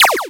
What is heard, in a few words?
blaster; SoundEffects